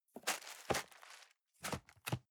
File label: cardboard box open unfold; objekte